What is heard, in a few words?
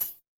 ui chime